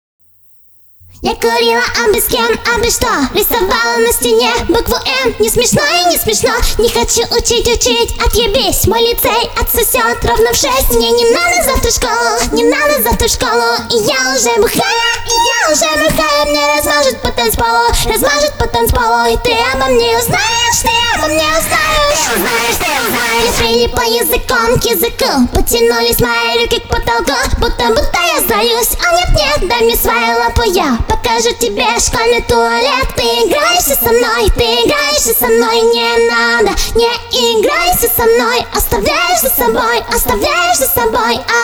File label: ACAPELLA, loli cover